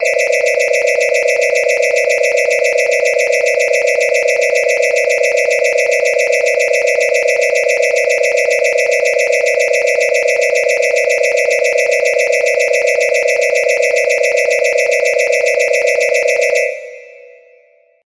melody

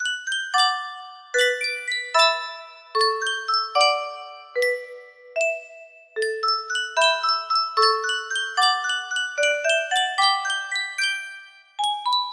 Sankyo Custom Tune Music Box, music box melody

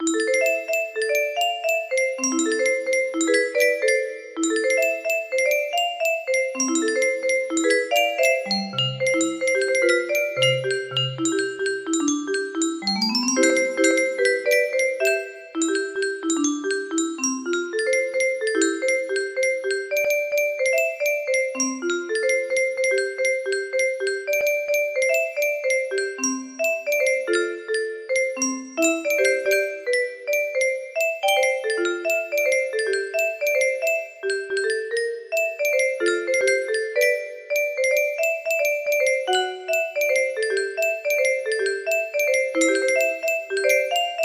in minor, music box melody

